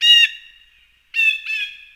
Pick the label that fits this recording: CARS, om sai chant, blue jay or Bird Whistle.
blue jay